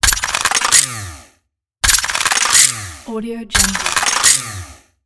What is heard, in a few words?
weapons foley metal servo variations; future weapons 2 source